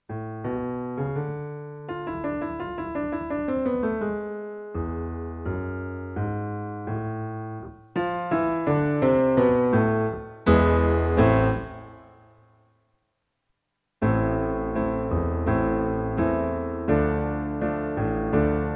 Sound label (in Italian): base piano lenta